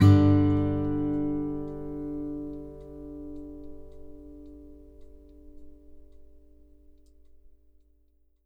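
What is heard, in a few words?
Country Guitar (Ending); Digidesign; Pro Tools Essential Loops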